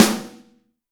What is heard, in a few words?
snare, Zoom MRT-3B